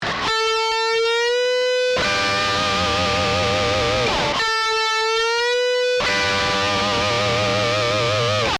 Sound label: Double Stop